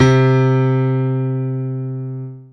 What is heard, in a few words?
PIANO, Dance Mega Synth; Best Service